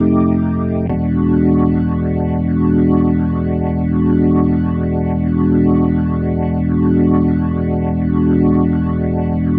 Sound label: Ending, Organ; Sonic Foundry (Sony Creative Software); Joe Vitale Organ Donor; Endings